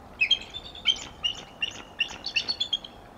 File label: 1st call section with chirps; raptorresource